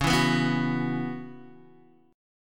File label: Db Minor 11th Db, Guitar DADGAD; Dbm11 strummed; x,4,2,4,2,4; JGuitar